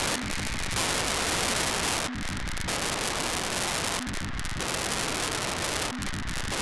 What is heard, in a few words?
SanoSat-1 436,235 GFSK 4x beacon; NO-116